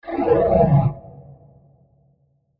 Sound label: Creepy Transition With Phaser; Scary Soundscapes (Sound Effects:Horror)